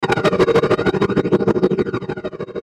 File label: Chopper Wah Phaser; Blastwave; Choppers